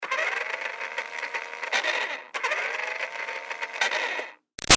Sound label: Toy Bounce Back Racer Med Far Run Short, toy car; Toy Cars; Toys; Big Room Sound